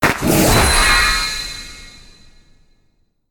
win Animation; koi princess

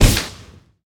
win Symbol Flash; fairyred

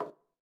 hit Soft; advanced-roulette

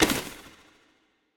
spin Button Down; fairyred

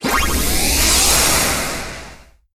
chocolate wheel disappears; koi princess

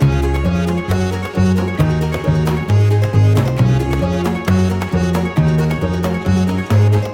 main loop layer; irish pot luck

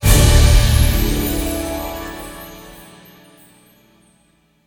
large win; fairyred

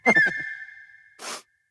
butterfly land; irish pot luck

